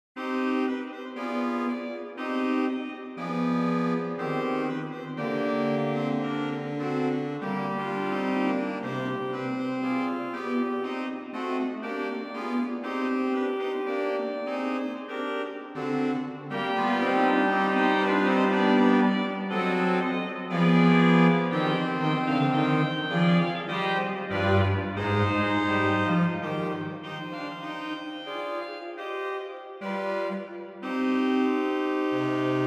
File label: Allegro, OrganMusic; Boely; Souvigny